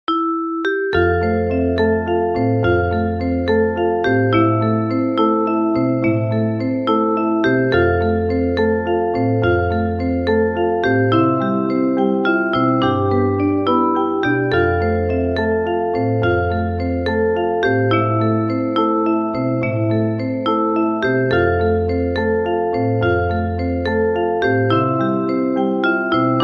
Hallelujah Instrumental

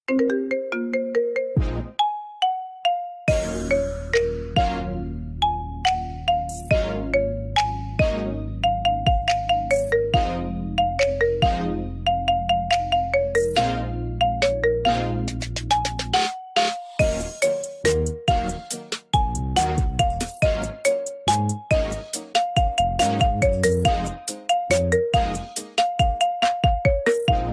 Marimba

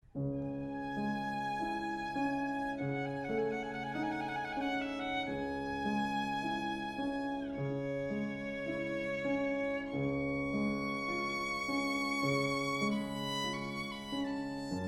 Nocturne sharp minor

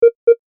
A Simple Beep

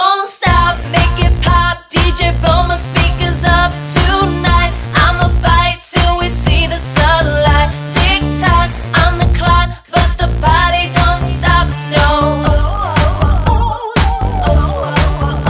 Sony Oled

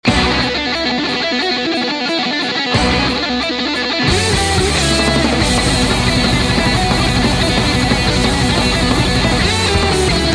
Rock Electric Guitar

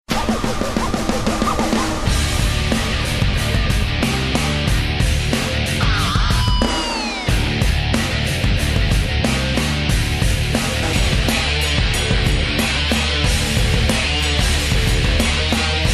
Rocking

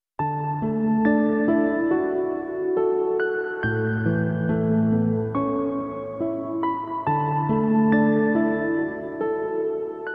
Alarm

